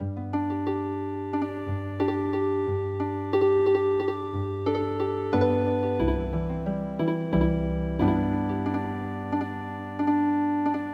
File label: Healing Harp; The Housewifes Lament